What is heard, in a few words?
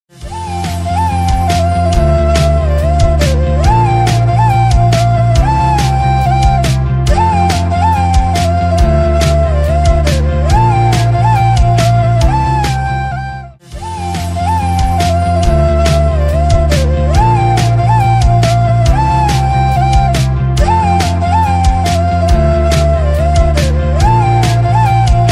flute ringtone instrumental; radha krishna